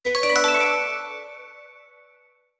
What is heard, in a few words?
Jingle - Answer; master detective archives rain code original soundtrack 2023